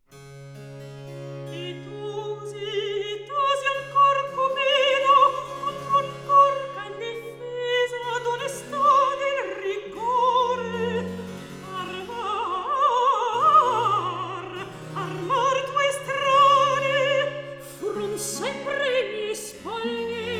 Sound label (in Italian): Recitativo; El osi ancor, Cupido; Alessandro Scarlatti; Duet Cantatas